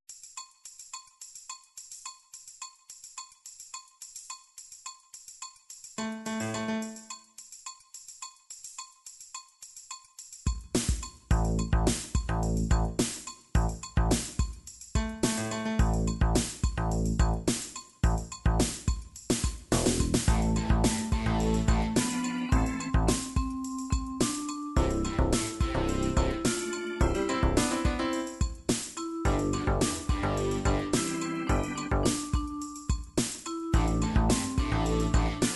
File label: italian, midi